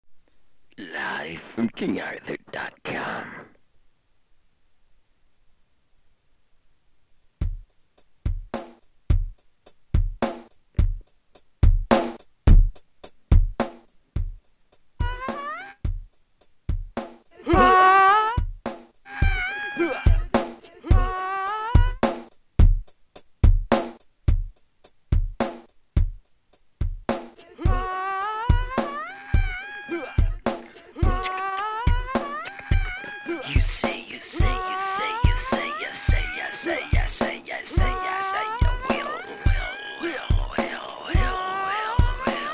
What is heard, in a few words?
Vocal Loop Experiment